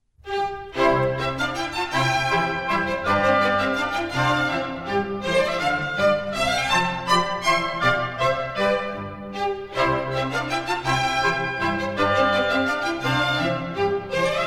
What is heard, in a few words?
Minuets, C Major; Mozart; Dances and Marches